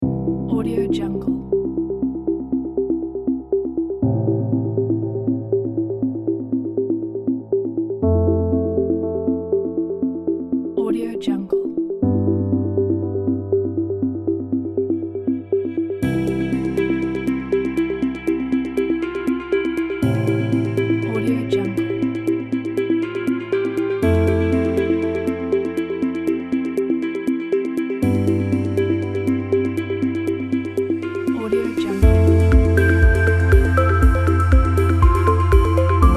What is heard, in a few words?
corporate achievement; penguinmusic